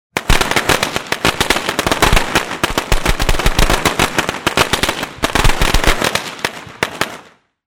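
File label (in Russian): звуковые эффекты петард и петард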